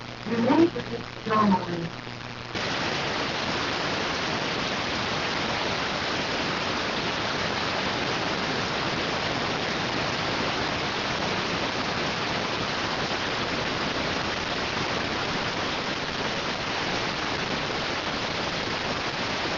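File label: radioteletype receive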